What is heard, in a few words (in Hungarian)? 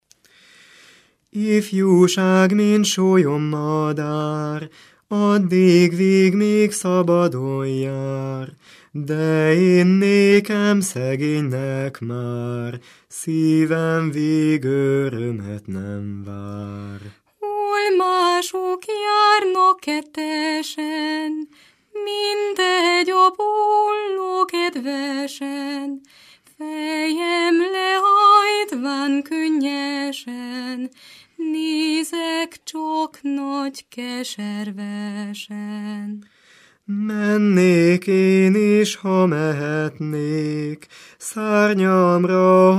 nepdal; ifjúság mint sólyommadár